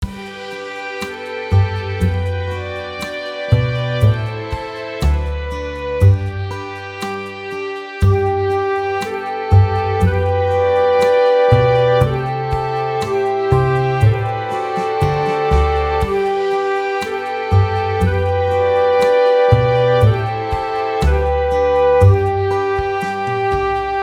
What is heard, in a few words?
Suo Gan Flute Recorder